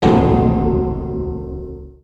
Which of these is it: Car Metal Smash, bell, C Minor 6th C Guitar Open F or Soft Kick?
bell